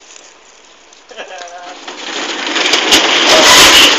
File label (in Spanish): bajando la persiana